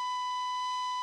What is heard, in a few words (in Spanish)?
flauta